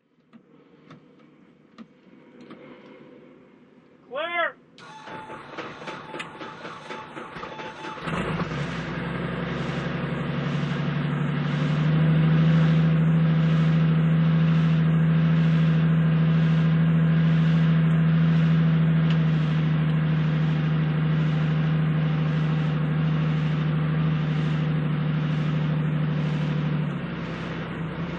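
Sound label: Heli Interior Start Liftoff, helicopter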